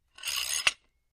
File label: Extending And Retracting A Steering Wheel Lock; The Club Lock